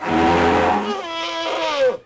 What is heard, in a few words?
Elephant Fidgt Vox; Civ4 beyond sword